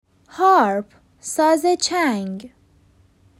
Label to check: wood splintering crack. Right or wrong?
Wrong